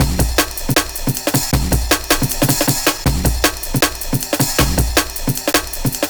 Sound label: Break, programmed loops; Zero-G Jungle Warfare 1, 2 & 3